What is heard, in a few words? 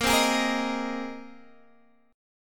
A sharp 7th Suspended 2nd Suspended 4th, Guitar, Standard; strummed; JGuitar